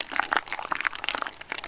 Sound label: lava; openffa